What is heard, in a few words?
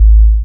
DR. LOOP, BASS; X-Static Goldmine 3